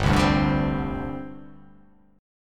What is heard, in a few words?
G Suspended 4th G down, Gsus4 chord; strummed; JGuitar